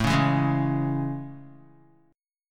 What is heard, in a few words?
A Major 7th, Guitar; AM7 strummed; 4,4,4,1,1,x; JGuitar